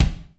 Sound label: BASS, Alesis HR16 drum machine